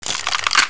ana foley

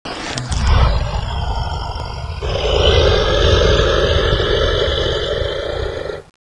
monster; cstrike

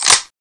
uzi bolt

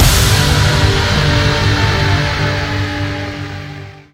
Hit Mono; cstrike

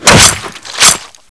ak47 knife hit player

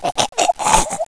pain, bot; cooked

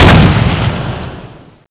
shoot; balrog7

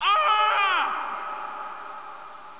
scream

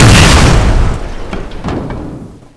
bazooka tank explode

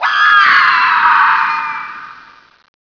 zombie death banshee; nst zombie

